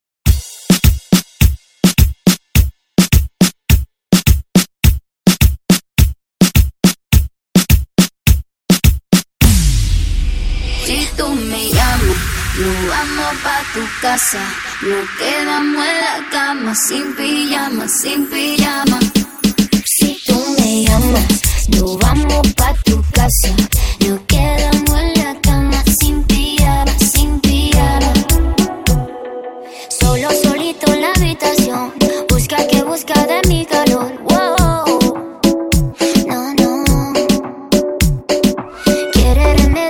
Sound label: REGGAETON INTRO BPM 105